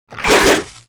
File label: attack